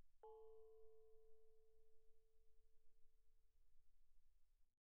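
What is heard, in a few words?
thai gong A4 pianissimo, Percussion gongs & tamtams; thaigongs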